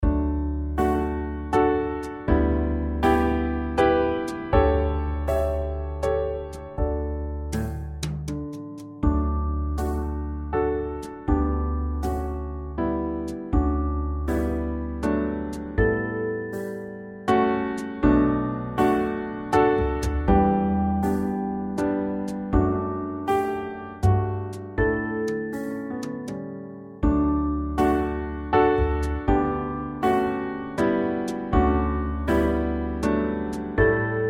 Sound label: violins willow